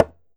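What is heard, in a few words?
conga pop; E-MU Producer Series Vol. 7 – Old World Instruments; Drums&Percussion; Salsa Kit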